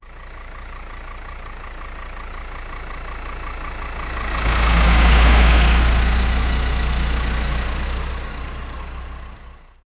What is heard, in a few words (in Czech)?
zvuk trovani autobusu ren, bus